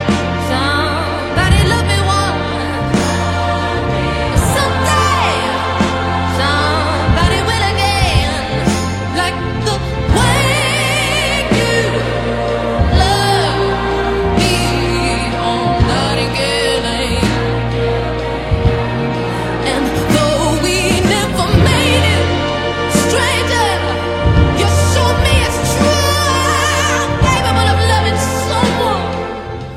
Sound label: Ringtone